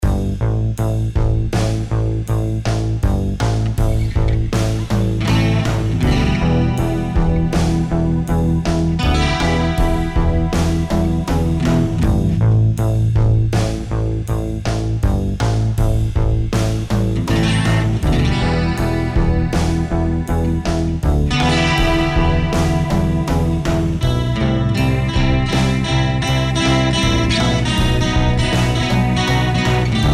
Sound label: december guitar